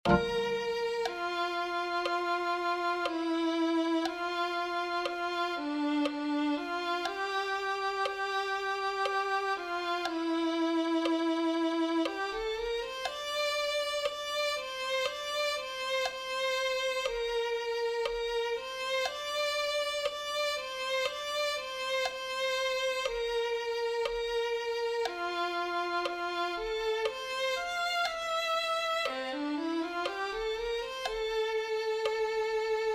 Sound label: violin 2